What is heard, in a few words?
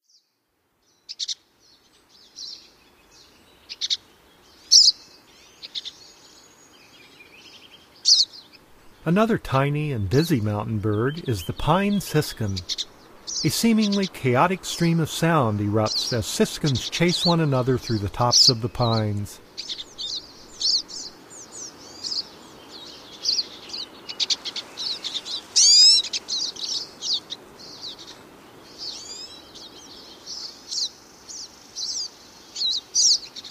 Pine Siskin; North America; Common Western Species; Know Your Bird Sounds